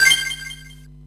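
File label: System Ok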